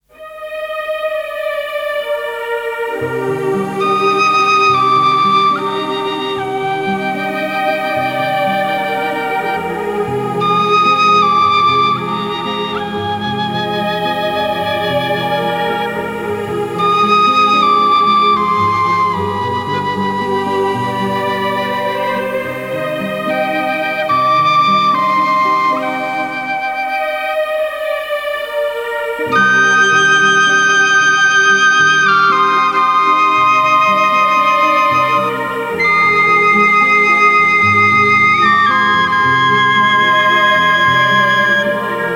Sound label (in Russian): музыка